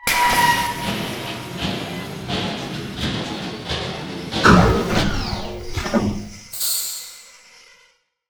Car Compacting Engine

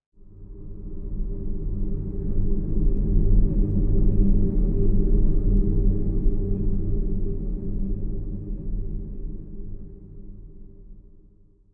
Amb Drone

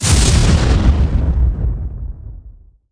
Lightning Bolt; lego ninjago rise of the snakes

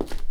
footstep; Hunters and Props

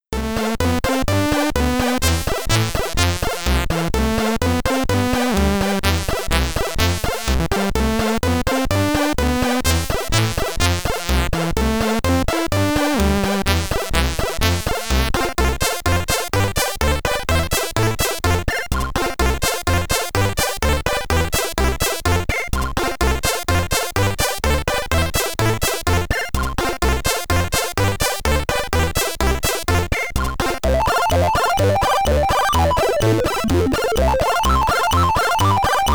Stage BGM, SID Stereo, commodore 64

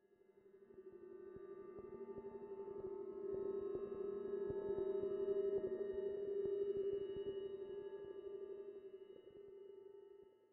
Basic Low Beat 85bpm; Legends of Pegasus; windows gamerip 2012

